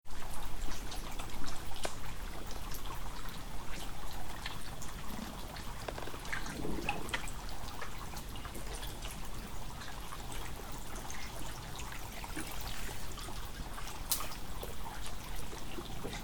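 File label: Tobin Harbor Underwater, Isle Royale National Park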